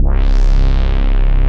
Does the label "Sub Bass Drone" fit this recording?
Yes